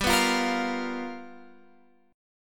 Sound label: G 7th Sharp 9th G chord, Guitar Open F; G7#9 strummed